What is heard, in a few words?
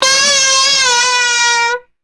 comical descending squeaky toy sound